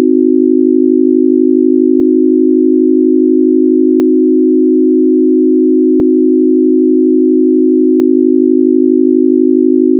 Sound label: buzz